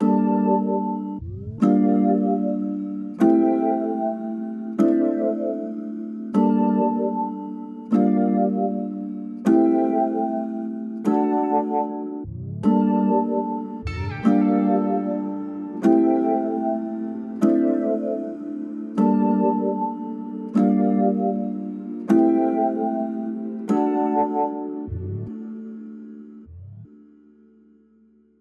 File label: Piano 152BPM Am Acoustic Melodic